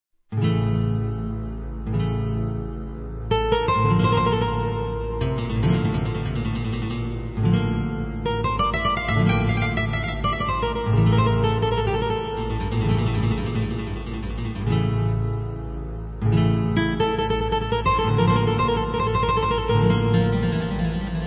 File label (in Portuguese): guitarras